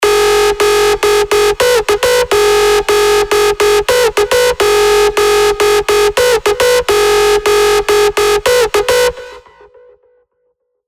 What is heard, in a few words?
Synth Loop F# 105 BPM; Spire; Redwine